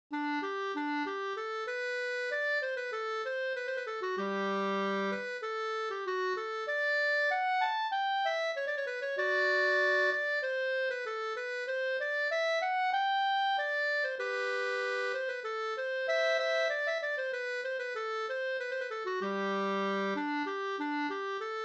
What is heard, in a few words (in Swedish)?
brudmarsch Hogbo brudlat